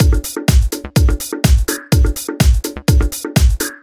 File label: Drumloop 125bpm; Analog jockeys